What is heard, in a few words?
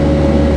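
hover tank; Smash Disk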